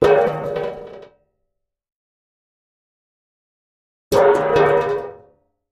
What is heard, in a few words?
Pot Saucepan Lid Fall; pots & pans